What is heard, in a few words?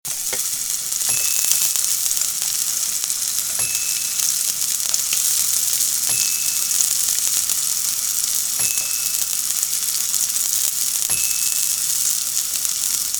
Medium Sizzle